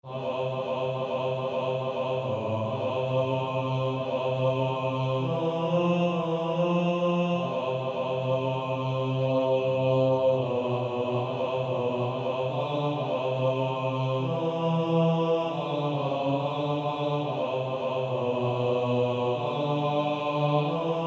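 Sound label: Male Choir, bass 1, Practice Music; Baritone; TTBB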